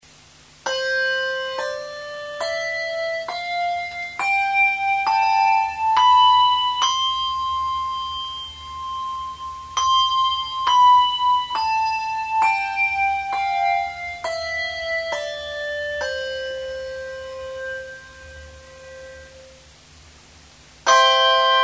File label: schulmelich sound, handbell